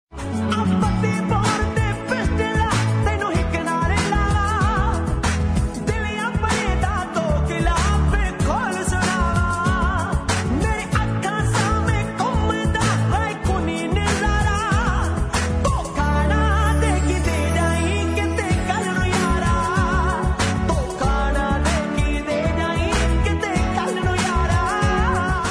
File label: Punjabi Song